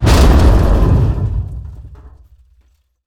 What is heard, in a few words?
Wings Damage In; DCS